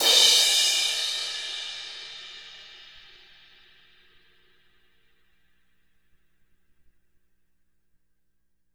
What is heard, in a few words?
CRASH